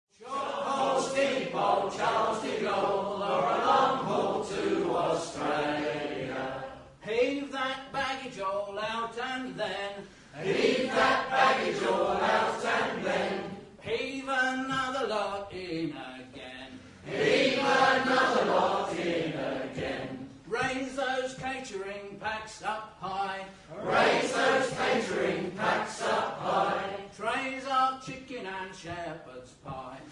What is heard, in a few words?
air shanty